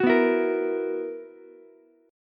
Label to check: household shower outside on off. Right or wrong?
Wrong